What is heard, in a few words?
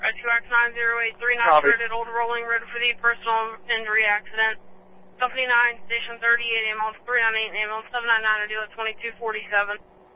Gunshot Old Rolling